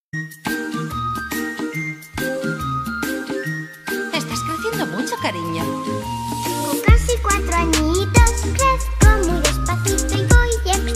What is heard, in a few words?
Trap Remix versión español; Caillou